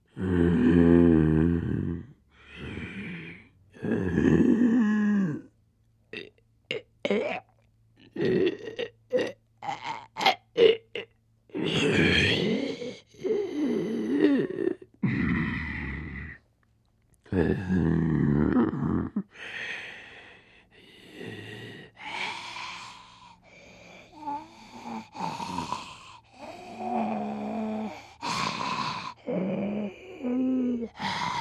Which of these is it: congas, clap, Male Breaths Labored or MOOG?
Male Breaths Labored